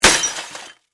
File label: Impact, Computer, Monitor, Smash, Crash; Smash Sound Effects; Smashes (Sound Effects:Objects)